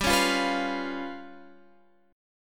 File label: G 7th Flat 5th